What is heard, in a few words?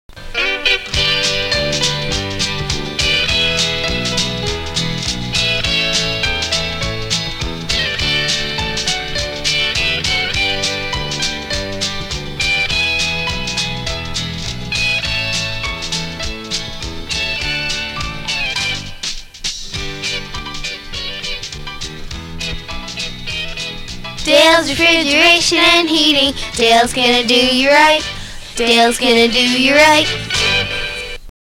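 Music with girls a little older singing end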